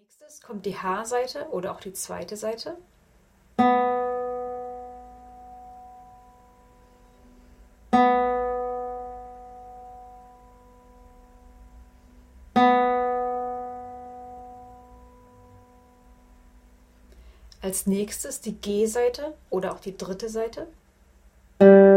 die sechs saiten der gitarre

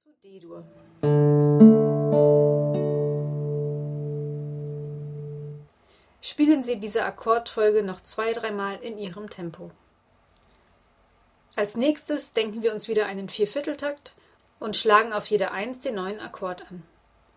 akkordwechsel d g a7

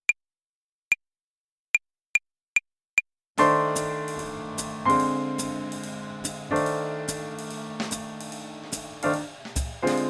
blues tonleiter play along fast

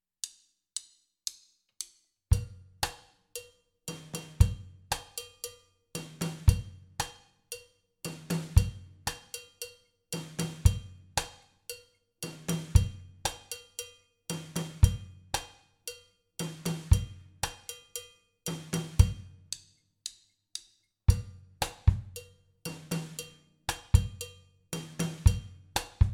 chacha mit bongobell

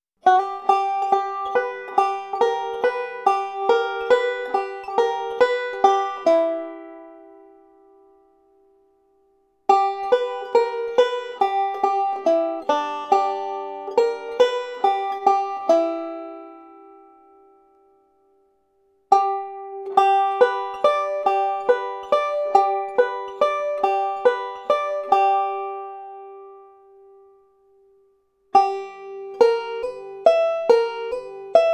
up the neck licks auf g

